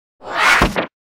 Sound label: Mon rabbit strange death